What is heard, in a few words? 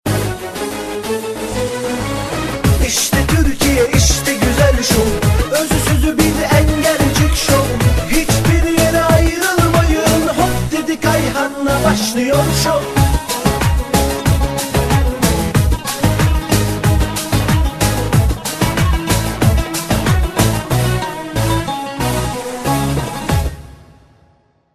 JINGLE